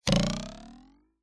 cartoon twang spring ruler ascending tail

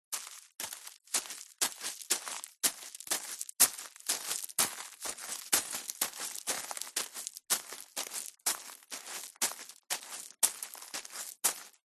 footsteps barefoot on small stones

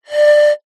musical pipe metal blow over top whistle tuned

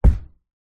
foley feet jump land carpeted wood floor hard

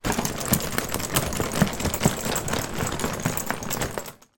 foley luggage suitcase shake

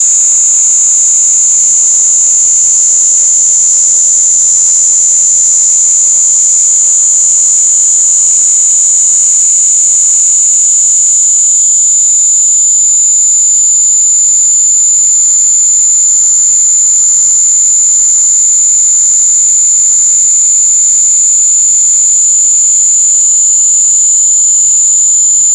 Signal Interference

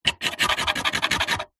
foley wood scrapes sharp metal object; zapsplat

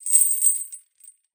Chains Tighten Whip Swing; Just Chains; soundbits

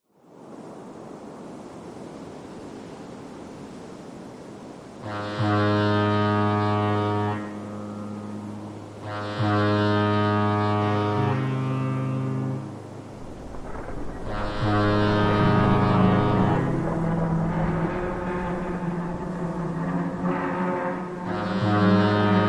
ships x2 collide crash scrape horns; vehicles; zapsplat

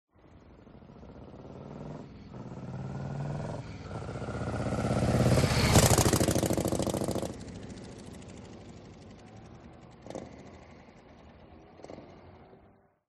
MOTORCYCLE Harley Drive By Accelerate; TRANSPORTATION

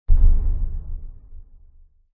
impacts thud deep heavy distant; zapsplat

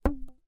hair elastic band twang; foley

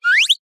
cartoon slip trip or fast ascend

